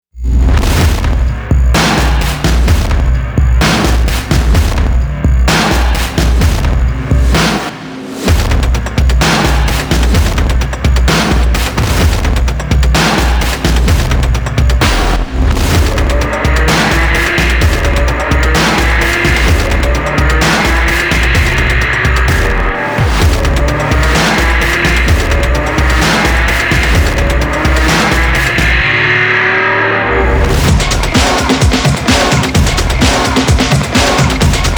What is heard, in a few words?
drums